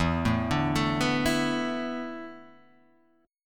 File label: E 7th Suspended 4th, Guitar, Half Step Down